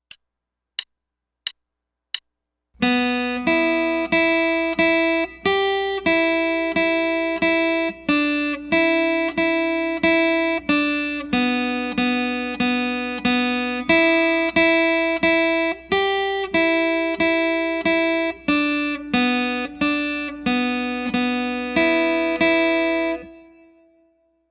Indian Drum

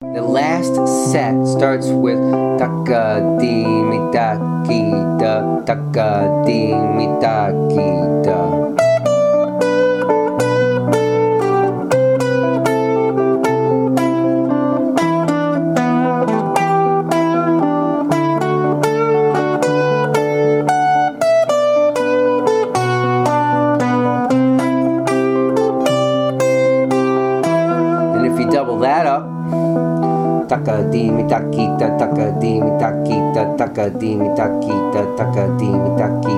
claves to rock vamp

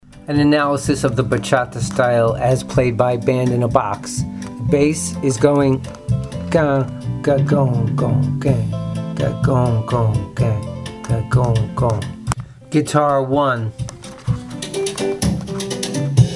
bachata

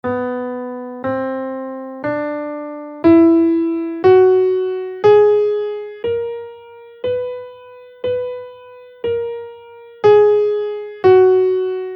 neapolitan major scale in Bm

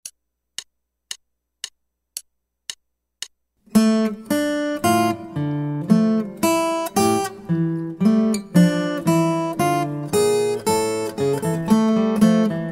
Guitar

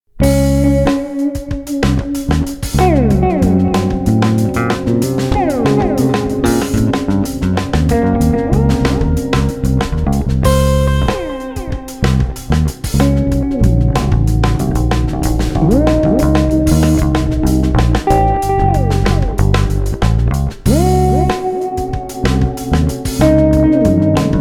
lydian augmented

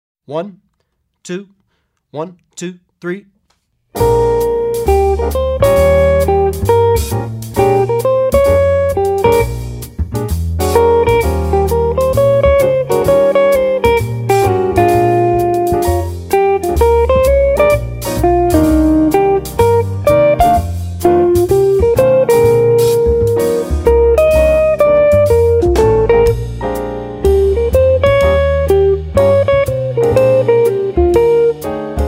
Blues With Soloist

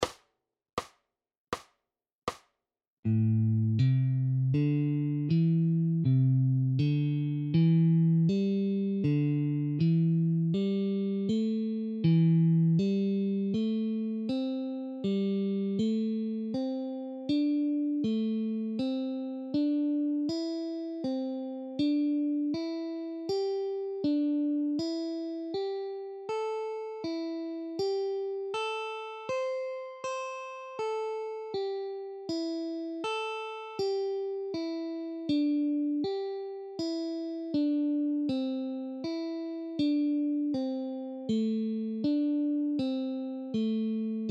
alternate picking at 40 bpm

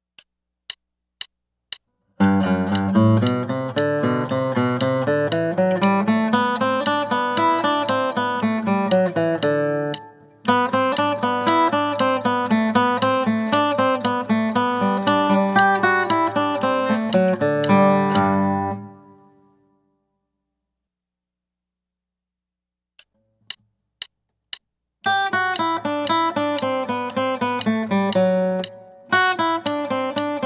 Picking Studies In G

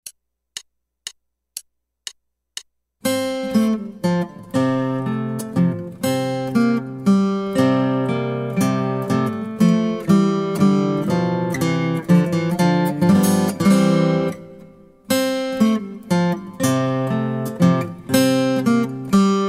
Guitar

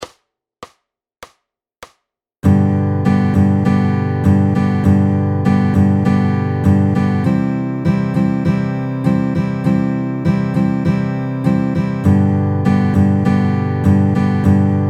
G to C 100 bpm

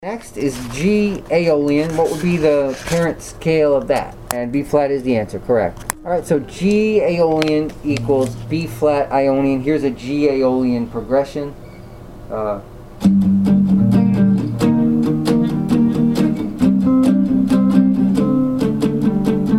modes with common root of g aeolian

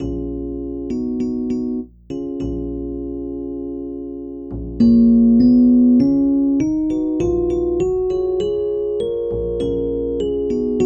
phrygian

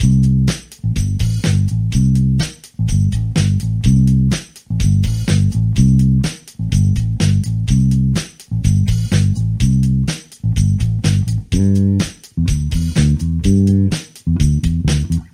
bass lines

